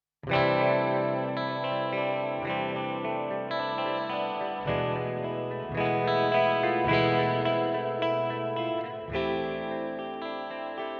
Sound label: Hall Reverb; GFI Systems Specular Tempus